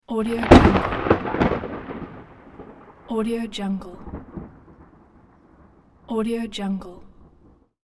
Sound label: close strong thunderstrike